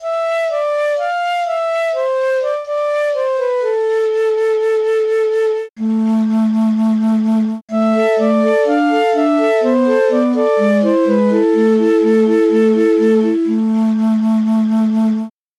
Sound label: piccolo